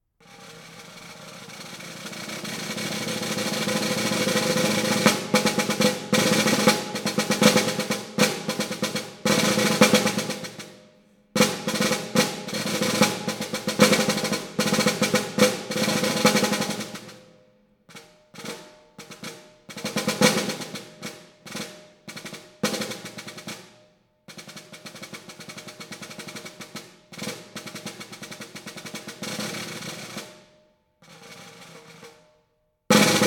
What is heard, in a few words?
Waltz